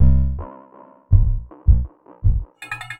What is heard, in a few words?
Udu; Sonic Foundry (Sony Creative Software); Ma Ja Le Ethereal Textures